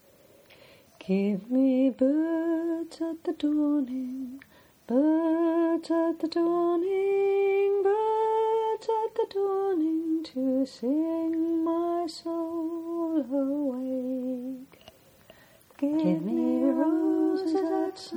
Tenor

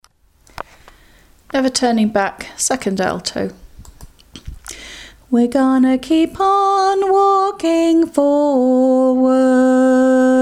alto

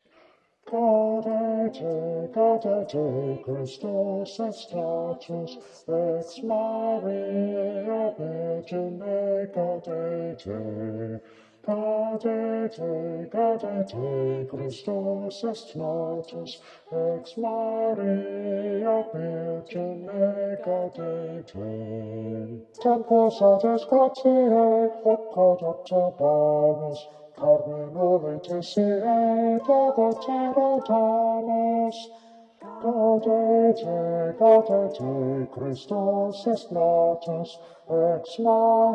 Bass, choir

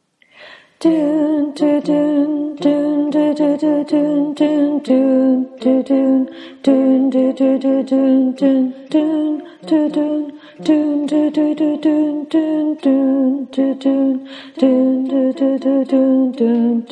Tenor

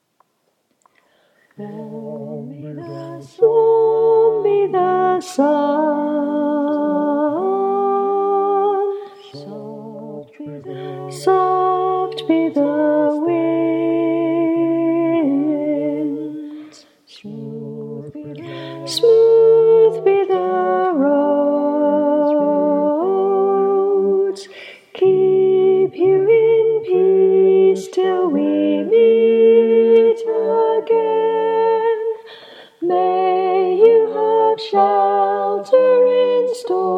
Harmony, choir

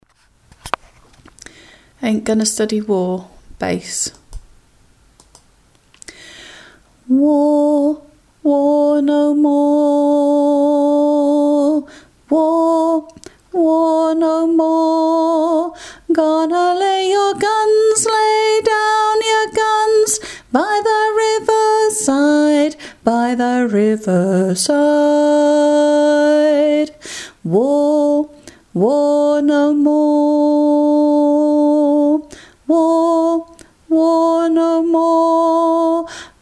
bass

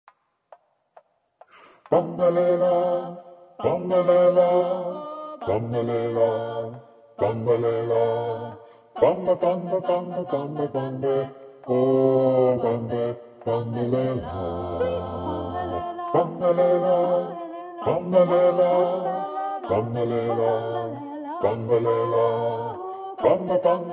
Bass

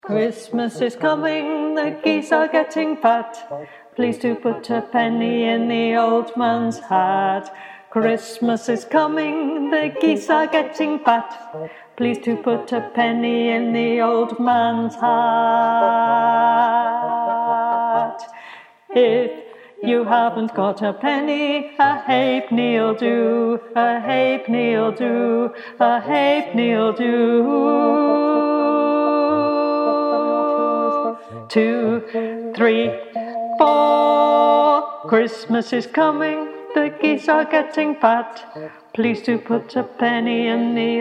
Tenor

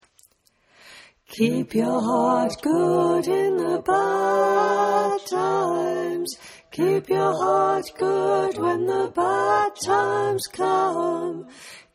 alto

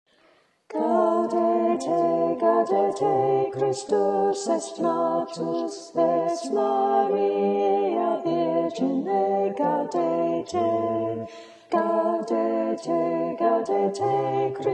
Alto

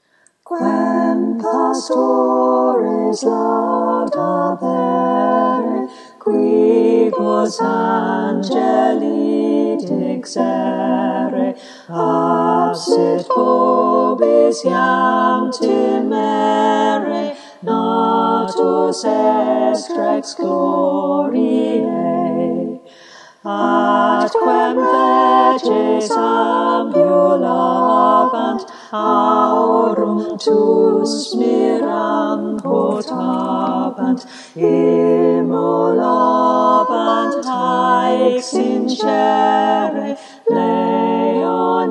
choir

